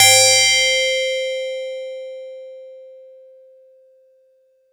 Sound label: tink elfin; CMP - Analogon